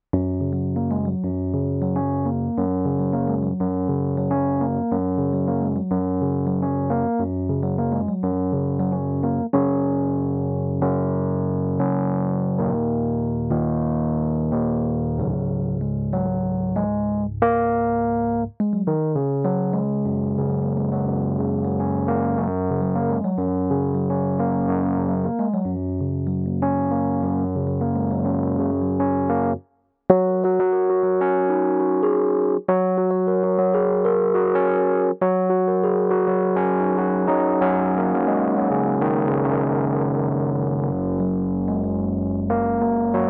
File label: instrumentals